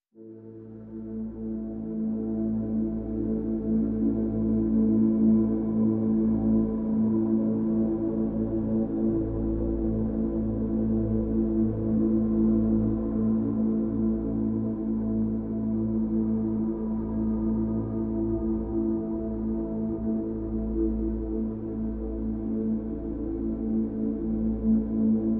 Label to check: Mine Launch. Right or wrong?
Wrong